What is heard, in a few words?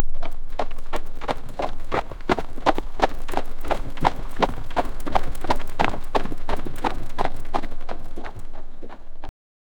a runing horse on dusty, hooves